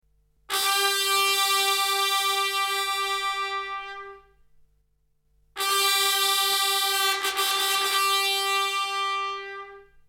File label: fanfare trumpet